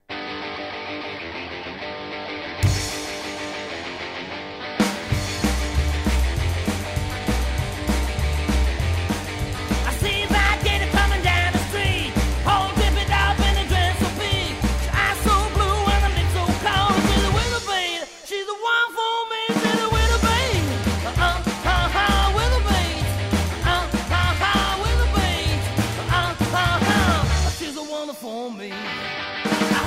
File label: ROCKABILLY